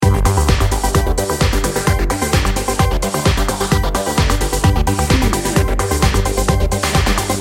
technology driver loop

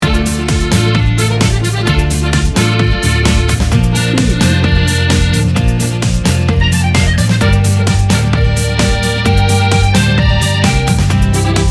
latin pop dance loop

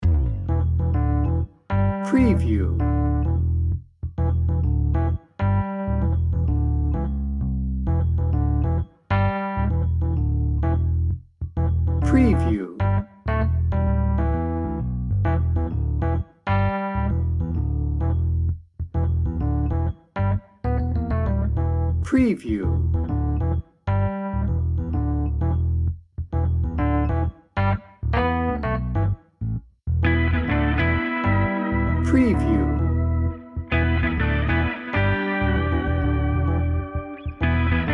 day trippin no drums

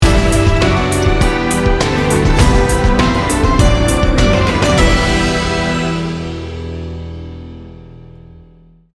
earth tones sting bumper

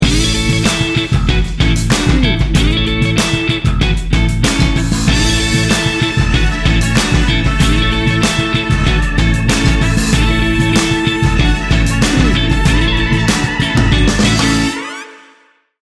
memphis soul

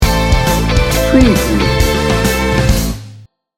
sting bumper

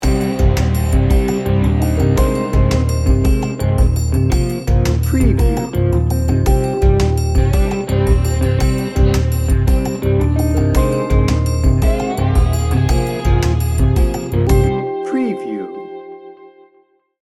swampy tension

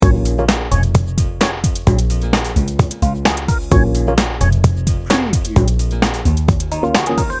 party set loop

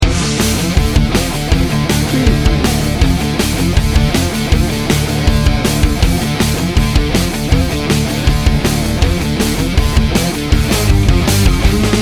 power punch loop

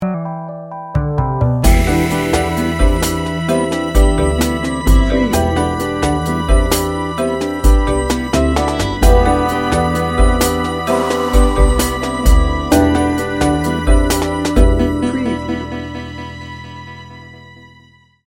breezy and positive

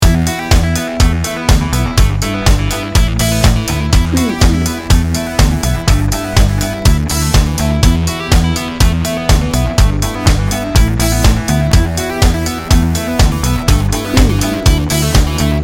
dance magic loop

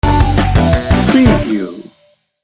cartoon critters sting bumper

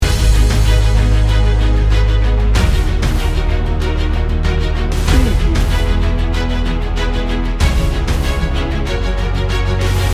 cinematic power loop

